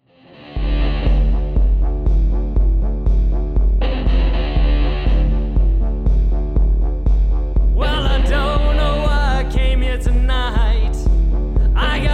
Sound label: Music